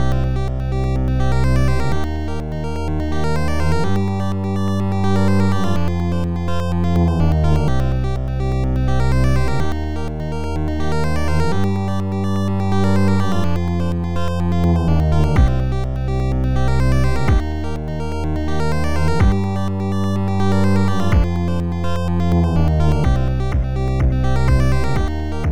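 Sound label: KEYGEN MUSIC RINGTONE; IE ORGANISER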